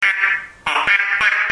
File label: duck call